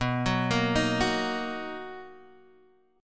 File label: D sharp Minor B, Guitar